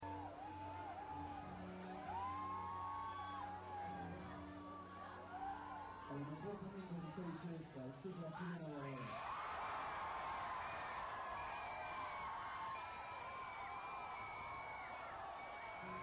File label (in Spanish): sirena